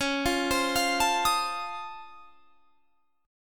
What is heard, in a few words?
C sharp Augmented Major 9th down, guitar chord; C#+M9; arpeggiated; JGuitar